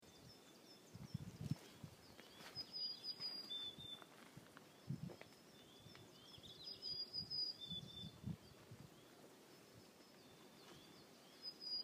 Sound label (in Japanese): kogara